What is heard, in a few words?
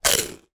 human crunch eat bite crispy